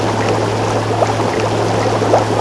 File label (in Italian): Ruscello, natura